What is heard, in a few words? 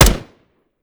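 weapon fire player; gmod; viper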